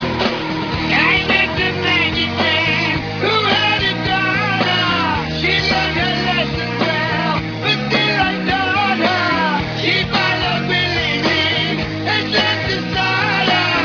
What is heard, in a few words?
water